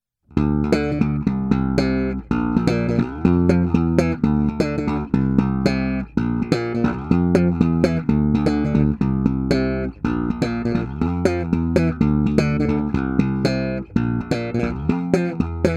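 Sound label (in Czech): Slap na oba snímače, basa; Vlček Basses Fineso 5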